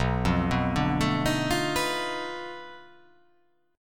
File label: B 7th Sharp 9th Flat 5th, down